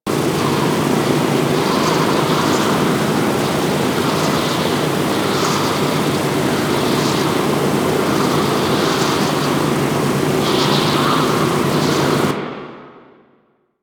Bomber Engine Loop Very Close; Warframe; Eidolon; Grn Bomber

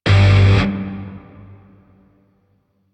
Shawzin Maj Chord H; Grineer; DE; Warframe

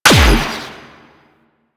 Air Pistol Shot; Warframe; Corpus

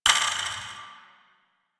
Button Timer; Medusa Puzzle

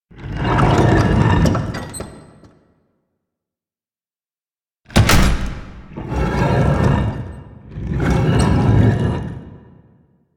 Operator Amp Case Movements; Warframe; Eidolon Plains

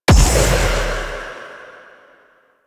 Sidearm Proj Explosion, Weapons; Warframe; Tenno; Wraith Sidearm

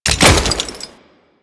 Death Knee Drop; Royal Guard; Foley

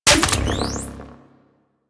Shotgun Mech; Crp Shotgun

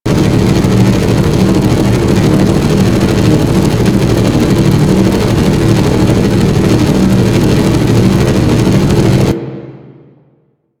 Generator Fan Loop; Ambience; Props; Warframe; Orokin

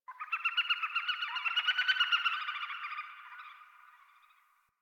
Birds Medium, Ambience, Plains; One Offs; Warframe; Eidolon Plains; Eidolon Landscape

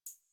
Computer Room Beep Misc

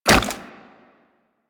Wall Jump Goo; Footsteps; Warframe; Tenno; Wall Run

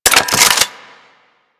Reload, Weapons; Latron Prime

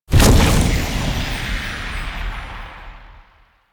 Puffer Activate; Ambience; Orokin; Warframe; Venus Open World

